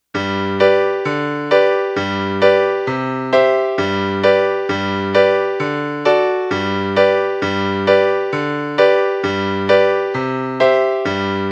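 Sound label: accompaniment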